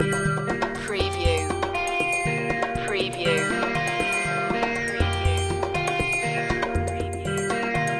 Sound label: ethno breaks loop